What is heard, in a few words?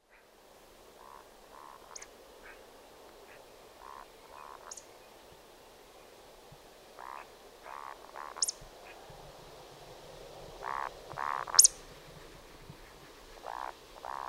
woodcock